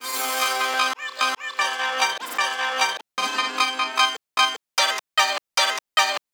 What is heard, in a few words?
HipHop Vinyl Chop C min; Digidesign; Pro Tools Essential Loops